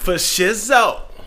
Rap Vox

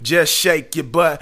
Rap Vox

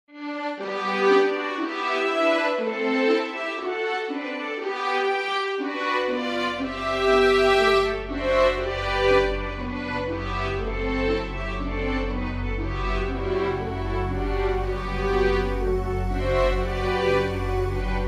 the horror of auld lang syne

